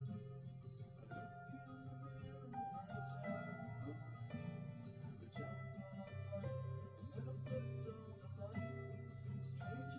nodrums liverec 1m; SASSEC07

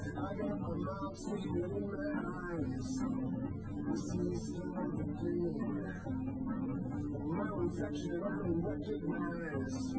wdrums liverec 250ms 5cm src

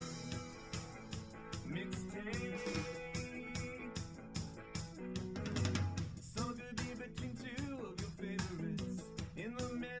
wdrums liverec 5cm; SASSEC07

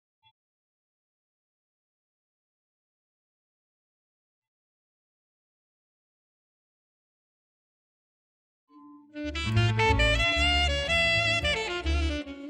Sax; ICASSP11

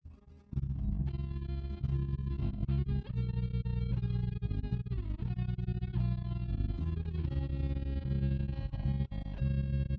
no drums inst; SASSEC07

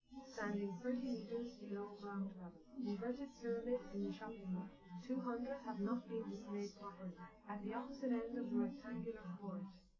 female live rec 5cm sim; SASSEC07

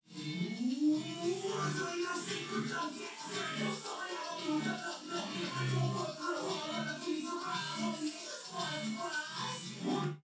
drums; SiSEC11; underdetermined

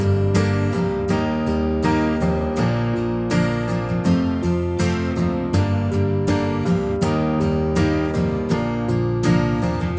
nodrums mix; SiSEC10; underdetermined

